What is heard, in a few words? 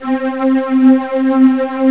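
tremolo strings; gmplay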